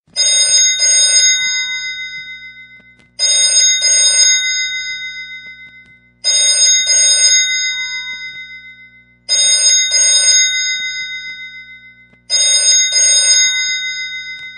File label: eurobell; Remax